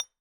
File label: Bottle Struck